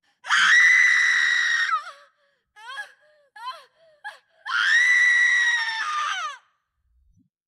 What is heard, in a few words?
woman screaming